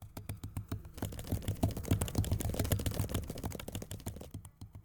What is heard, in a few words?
run; credits